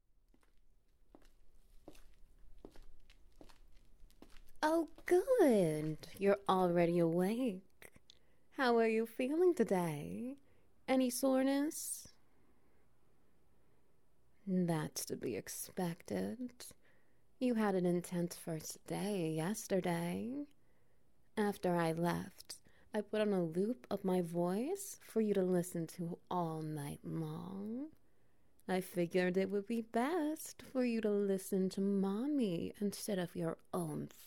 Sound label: SFX Wet Noises; Babydollification Day 2